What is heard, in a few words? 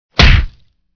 punch